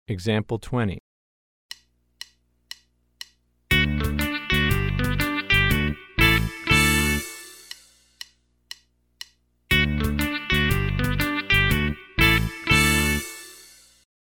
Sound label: Country Rock